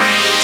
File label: Synth Sound; Vengeance Samples; VEE Synth Shots